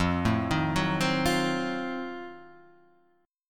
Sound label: F 7th Flat 9th, Guitar Open D